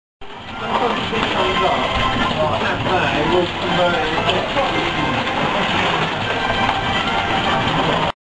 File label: pachinko